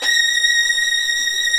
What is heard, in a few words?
STR SW VLN, Vlns 8 fff; Roland - String Master Series